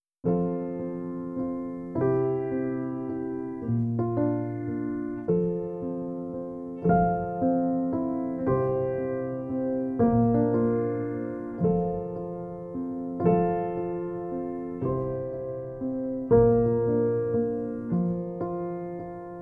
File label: Piano, Cinematic